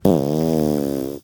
Long Deep Powerful Airy Sneaky Thunderous Bomber Steady Tremor Forced Wrecker, Farts; The Chris Alan; 1000 Winds Project